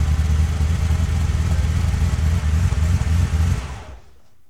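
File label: Ferrari Testarossa Off, Automobiles; SFX Kit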